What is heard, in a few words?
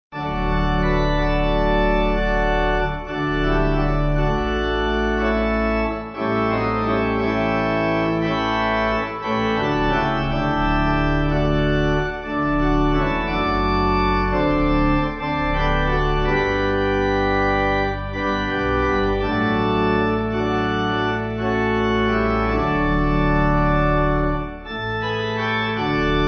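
Free From The Law Pipe, Pipe Organ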